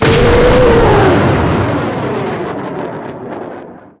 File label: 158mm shot, cannons tanks